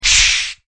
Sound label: BUG SPRAY